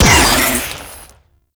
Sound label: Shot, Infested Beam Pistol; Coda Catabolyst; Weapons